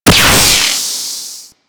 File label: Beam Fire; Super Moa Beam